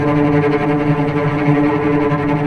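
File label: cellos tremolo D3 piano left, string; East West - The Ultimate String Collection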